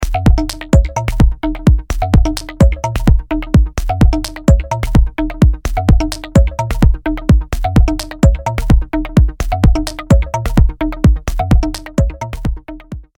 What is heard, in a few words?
drone